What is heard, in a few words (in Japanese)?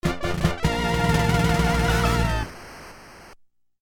ファンファーレ (FM音源版); fray msx remastered soundtracks 2008